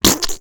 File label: Splat